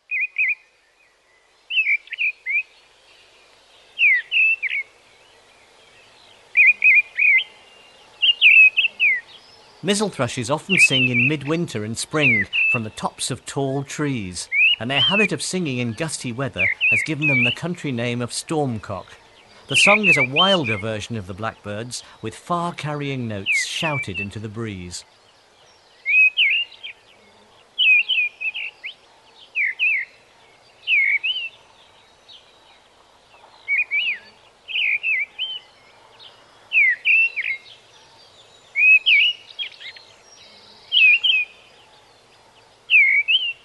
Mistle Thrush - Grive; bbc Bird Song Site

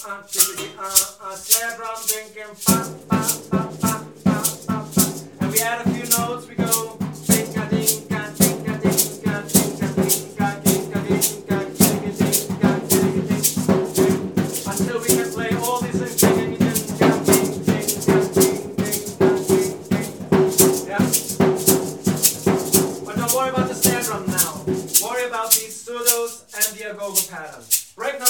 samba Surdos Drum

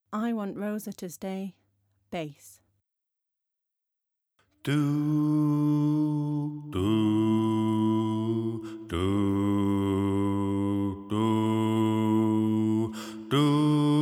i want rosa to stay bass

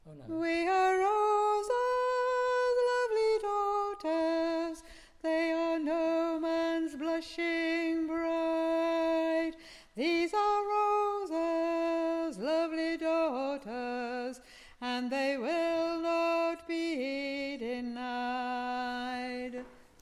Chorus Alto

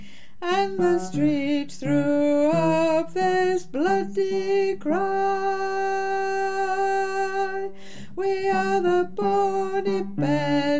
Chorus Bass

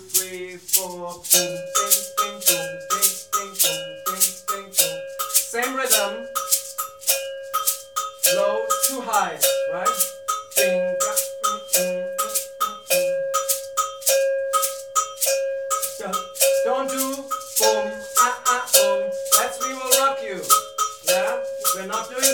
samba Agogo

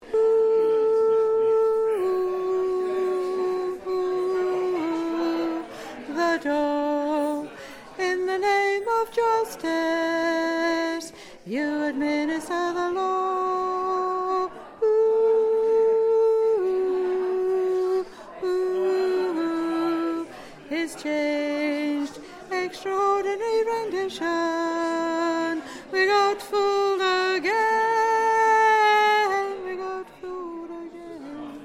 we got fooled Ten

